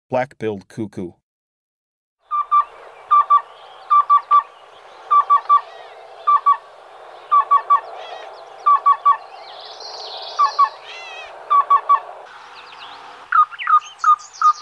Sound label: Black-billed Cuckoo, Breeding Birds of the Chicago Region